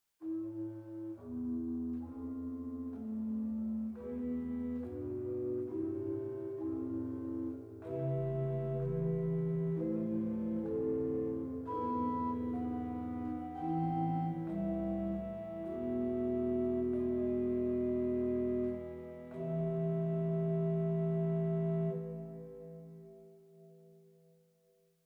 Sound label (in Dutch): Quintadena 16; zwolle